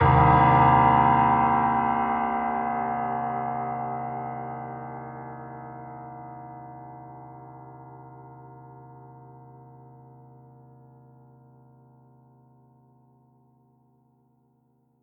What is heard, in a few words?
piano theme modular hypothesis; The Detail ios linux macos windows gamerip 2014